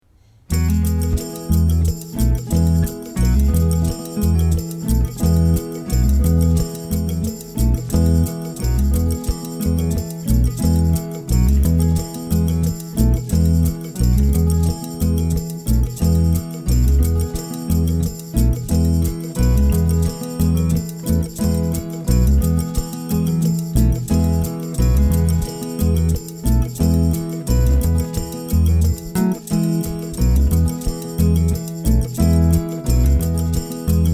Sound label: Acoustic Jingle